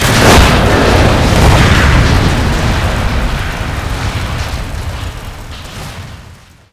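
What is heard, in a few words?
tank explosion large; WAR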